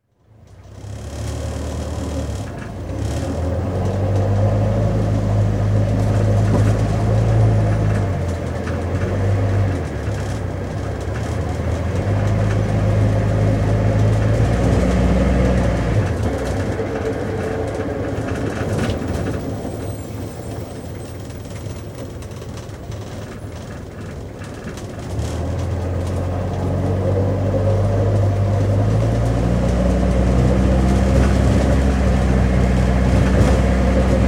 Taxis Diesel, Austin Taxi, interior, constant run (Austin Diesel Taxi Cab); Car; BBC